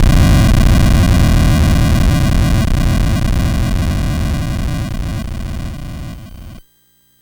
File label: NES FX Explosion, chiptune; Sonic Foundry (Sony Creative Software); Nintendo Entertainment System